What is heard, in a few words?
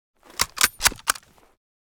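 mosin bolt, weapons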